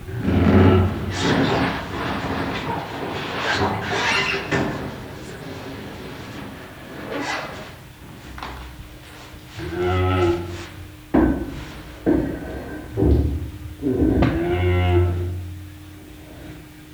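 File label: indian sai, インドサイ 鳴き声; 鼻をならすような音、うなり声、きしむような声、低い声など、意外に鳴き声を立てていることが知られています。; 奇蹄類; 多摩動物公園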